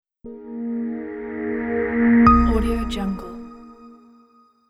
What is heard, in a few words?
atmospheric logo reveal